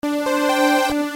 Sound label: Techno Game Sound